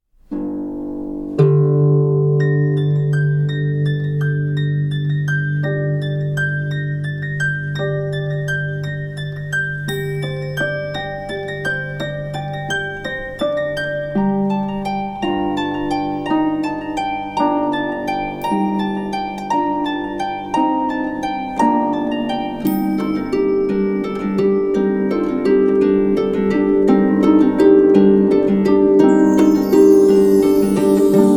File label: Carol Bells, Harp